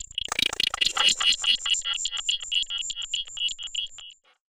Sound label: Ring Mod, Cymbal, contact mic; Murf & Ring Mod